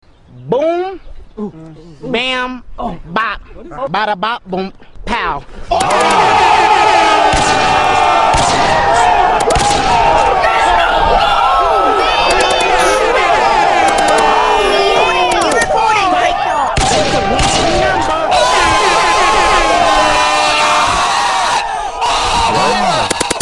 wake up alarm

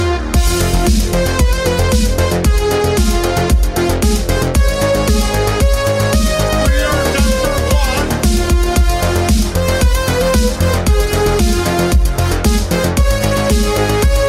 Synth

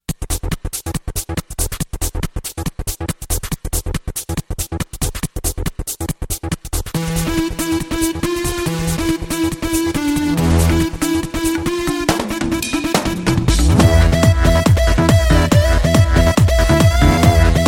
dj music; zanjeer

